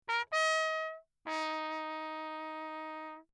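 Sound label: trumpet mute Lechner signal; Perinétventiltrompete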